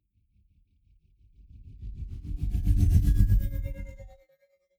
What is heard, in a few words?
sci-fi ambient security scanner; swooshes-swishes